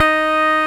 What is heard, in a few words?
Roxichord D#4, RMI E.Piano; Keyboards of The 60's and 70's